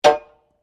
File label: SLEDGEHAMMER METAL IMPACT HEAVY